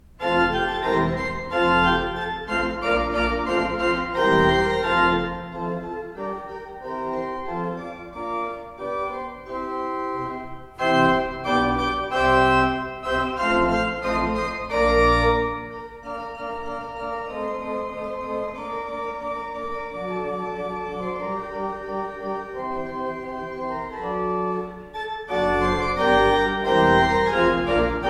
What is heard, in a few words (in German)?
Van Rossum 10 II P, orgel